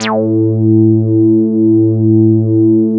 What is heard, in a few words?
MOOG TAURUS A2; Big Fish Audio - First Call Vintage Keyboards